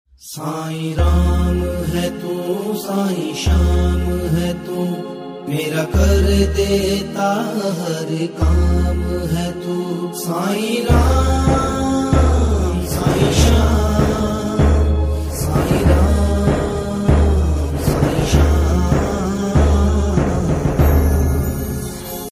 sai baba ringtone, odia ringtone, om sai ram ringtone